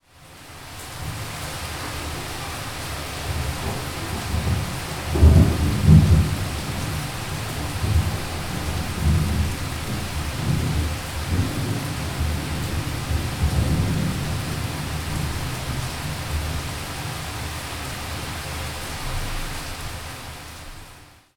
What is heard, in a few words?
mountain thunder roll with heavy rain; soundscapes